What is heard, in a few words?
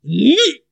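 strange male scream short single active muffled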